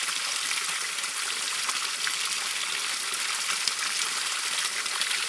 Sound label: RAIN Suburban Heavy loop stereo; Cielonos